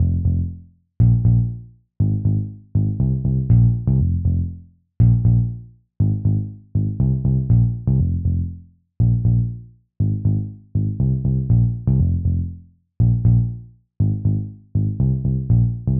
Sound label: Bassline